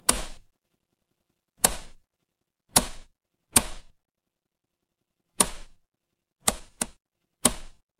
mechanical switch

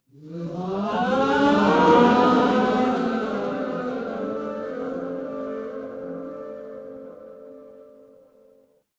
crowd gasp

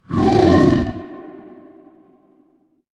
horror growl

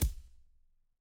impact punch

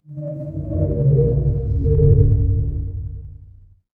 magic scifi spell

